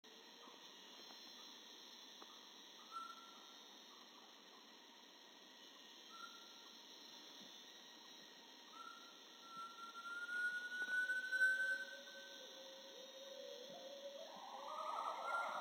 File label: hainan gibbon duet